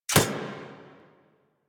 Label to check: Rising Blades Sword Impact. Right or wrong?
Right